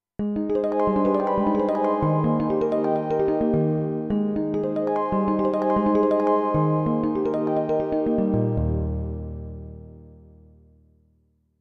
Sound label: Bell Harp